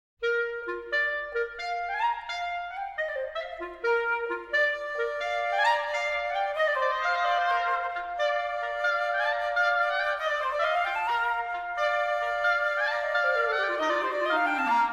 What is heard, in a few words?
Divertimento for Flute, Oboe and Clarinet, Allegro energico; Op.37 I.